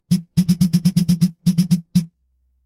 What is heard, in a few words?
office phone vibrate